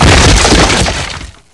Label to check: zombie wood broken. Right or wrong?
Right